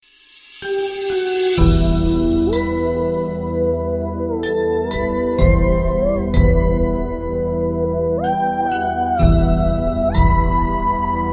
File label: sad background music instrumental tone ringtone